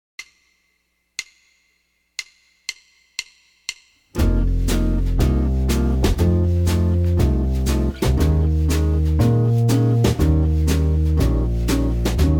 Root 5 7sus4 and P5 subs, jazz guitar

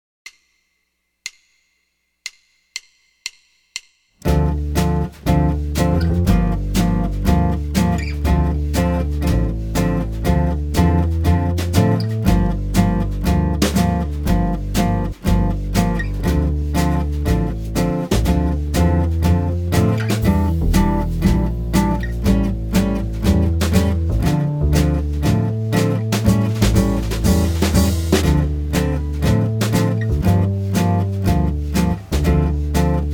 Minor Blues D Minor Root 5

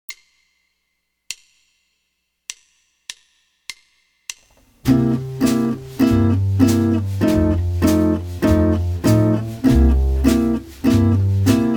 12 Bar Blues In Other Keys C Root 6, jazz guitar

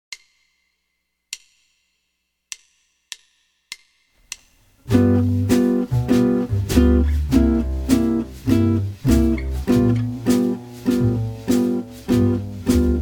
G Major Root 5

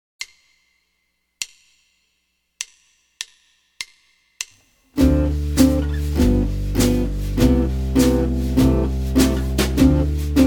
2 5 1 C Major, jazz guitar

